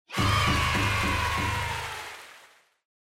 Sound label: Cheer Yay, High Short Applause